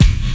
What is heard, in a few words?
short kickdrum sound c sharp key